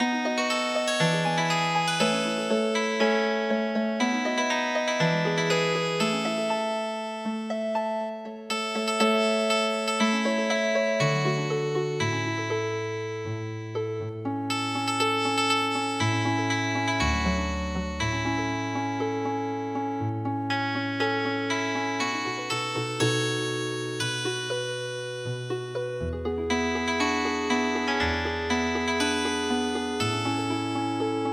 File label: Healing Harp; midi; traditional